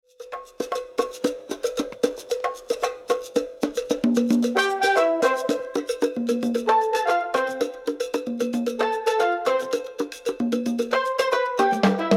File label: Tropical beat